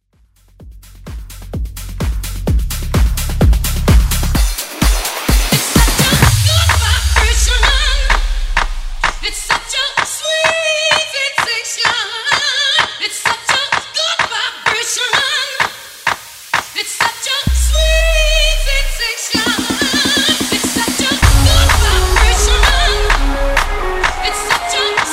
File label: Clap A Pela Drop